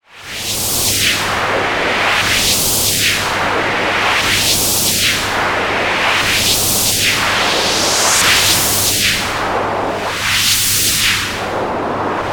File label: Dual VCF; ToneBoosters